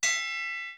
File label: Bell